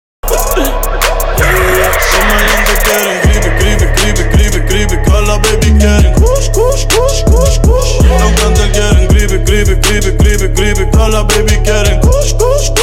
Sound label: LATIN TRAP